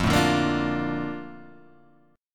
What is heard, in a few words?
Bb Major 7th, F